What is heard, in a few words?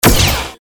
robot gun shot